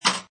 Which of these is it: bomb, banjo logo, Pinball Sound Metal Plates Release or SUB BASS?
Pinball Sound Metal Plates Release